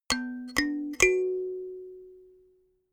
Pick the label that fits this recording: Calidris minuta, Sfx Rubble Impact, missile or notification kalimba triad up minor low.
notification kalimba triad up minor low